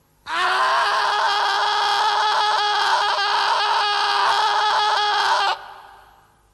screaming telephone

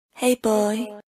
k pop sms tone

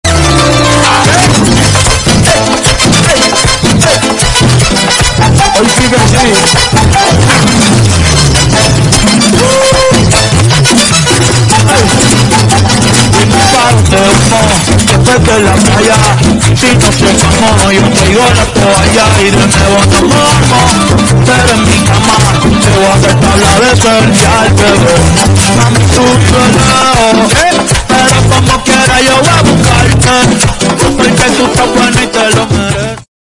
salsa

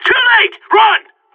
bomb exploding

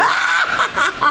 laugh

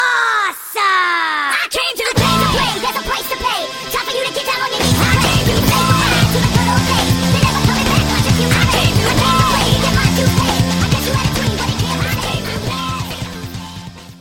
gioal song fast

